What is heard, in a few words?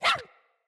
Puppy Idle Bark, Vocals; Kubrow